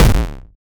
pc game ball hit wall

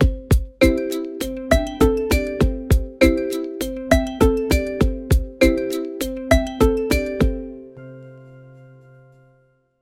happy electronic pop beat

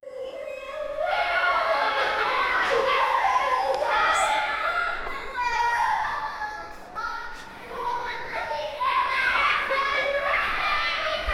the courtyard of a school

